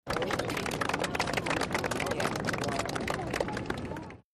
50 People Clap Outdoo

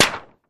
Target Hit Light Pap, target range

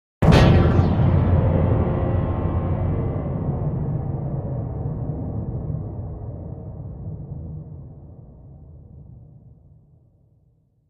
Impact Lfe Metallic

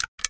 caulk gun pump, Pumping; Caulking gun being loaded and unloaded. Tools, Hand Clicking, Woodworking Carpentry, Pumping

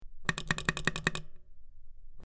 Button Press In Out Sp, switch clicks

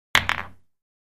Billiards Ball Othe

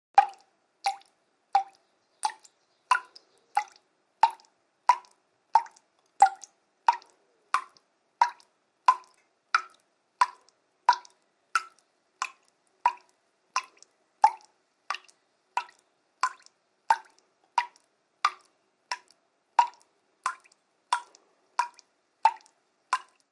Water Drip Med

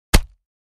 Body Punch

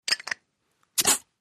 Beer Bottle Opn Opner

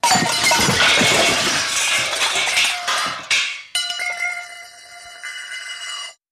Comical Crash Spin, crashes

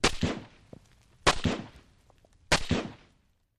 M-16: Single Shots With Impacts And Debris

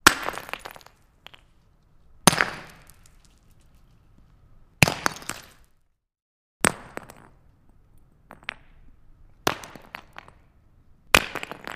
Brick Smashing On Tarma, rock impacts

